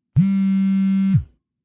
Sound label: vibration, phone